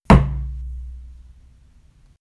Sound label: knock